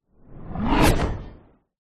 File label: sound design whoosh dark growl impact metal shrill